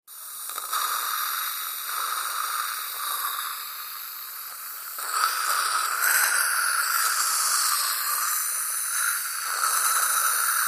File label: Dentist Suction Tube; balloons